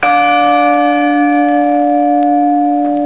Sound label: bell sounds